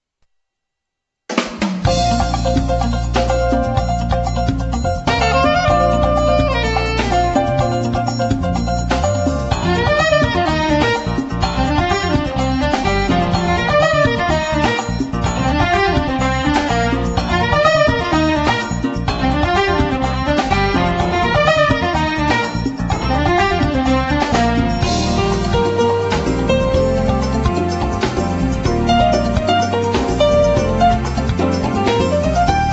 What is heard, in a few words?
fiddle